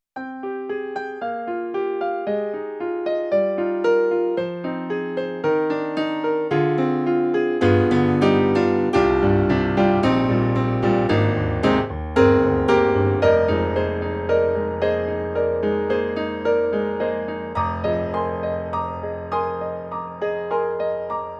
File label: KORG KROME Grand Piano